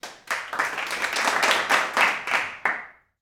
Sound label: Clapping Crowd Studio